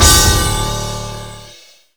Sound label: drum hit whistle